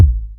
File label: Kick, Drum Machines